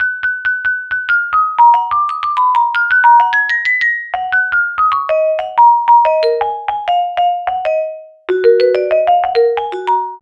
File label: Vibes